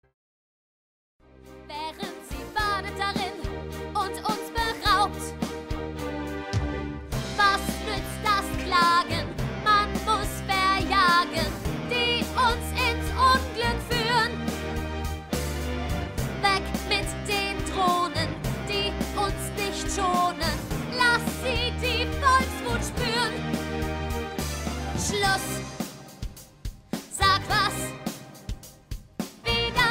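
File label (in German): alto high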